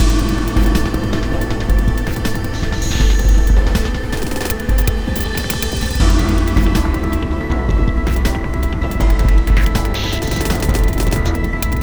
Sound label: Steelworks Medium; rive wreck hack die retry; ps4